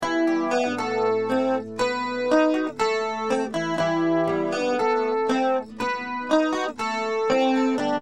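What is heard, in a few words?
acoustic guitar Rockman stereo chorus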